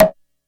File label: Cluck one shot, SFX; Sonic Foundry (Sony Creative Software); Electronic Point Blank